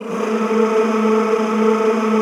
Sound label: A2, Extended Classical Choir; Best Service